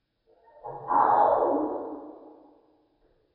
bird budgie song; dfcaverns; df ambience

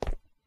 bedrock step; server-nalc

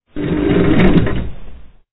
drill; dfcaverns; underworld items; slade

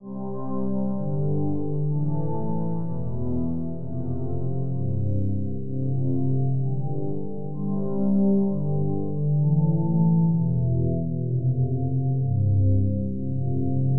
ambiance; Ambience

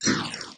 fail; minetest returnmirror

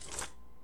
turn page; cartographer

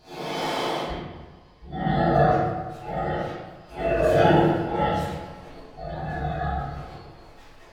pig grunting grumbling; dfcaverns; ambience

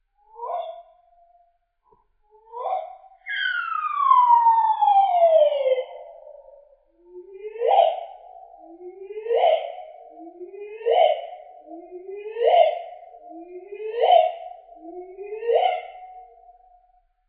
solitary bird song; dfcaverns; df ambience

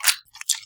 nether extract blood; nether-pack

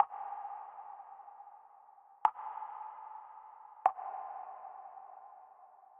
Spooky Water Drops; ambience

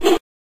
spears sound

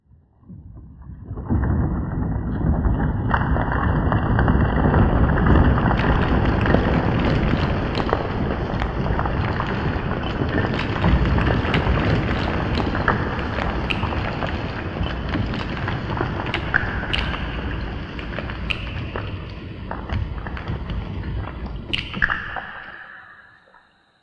avalanche; ambience; dfcaverns

